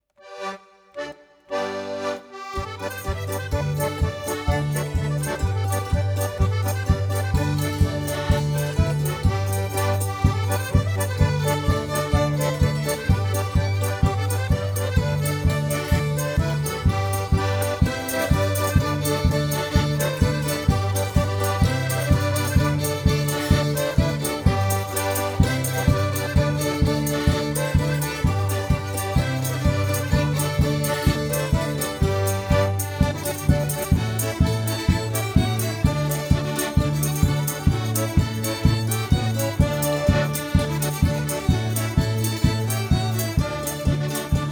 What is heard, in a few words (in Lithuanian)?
Polka; Daina